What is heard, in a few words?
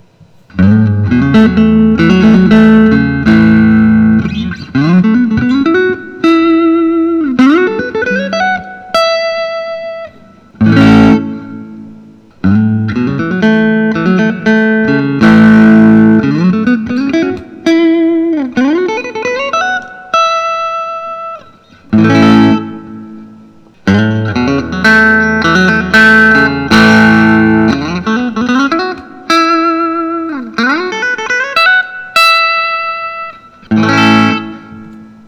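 fender stratocaster sunburst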